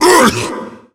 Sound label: tank pain, zombie; Left 4 Fortress